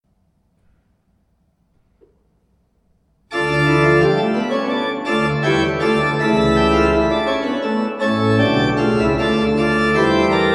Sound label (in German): Präludium d-moll; J.S. Bach